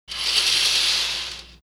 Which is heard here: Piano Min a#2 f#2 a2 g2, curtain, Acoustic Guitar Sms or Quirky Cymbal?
curtain